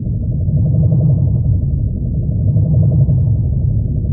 amb hum; terminus

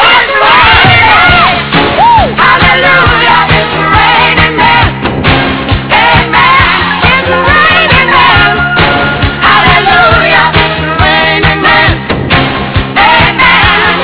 men, ambience

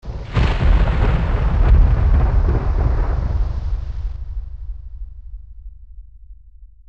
lightning; hydrosity

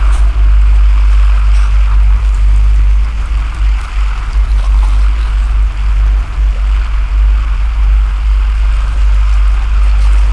incubator; marineris

